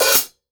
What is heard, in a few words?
open closed, hi-hats; Sampleheads; New York City Drumworks VOL-1